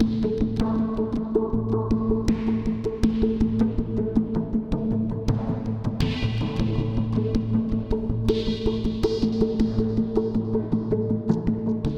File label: Rhythmic Loop; Void Bastards